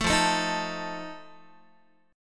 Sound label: F 7th F down Guitar; strummed; 12,13,12,13,13,x